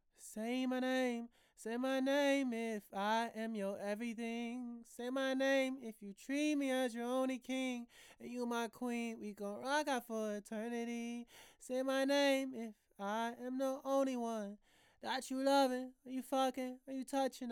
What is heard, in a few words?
RnB 143 Fm